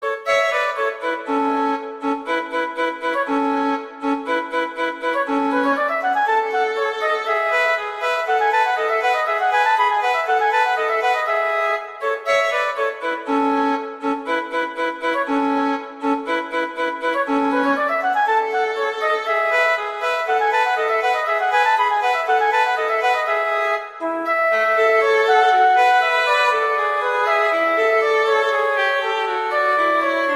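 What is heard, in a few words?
Duet, horn; Mozart; Duets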